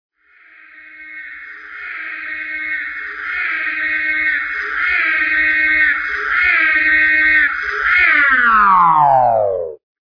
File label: Ecto Approach & Stop